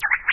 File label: Cartoon Slide